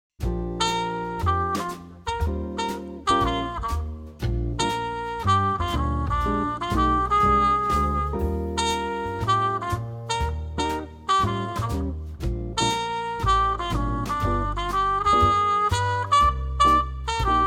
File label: Play Along Blues